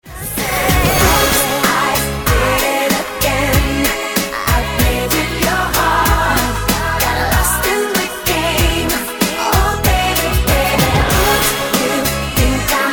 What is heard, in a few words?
chorus